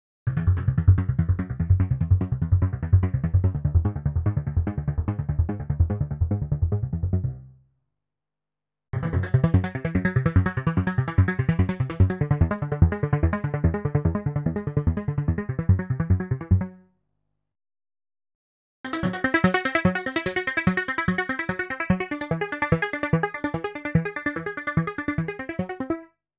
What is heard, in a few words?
Rhythm Sample + Hold C1-3; Klaus Schulze Collection